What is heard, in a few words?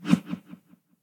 slashes; SyrinScape; Temple of Urgathoa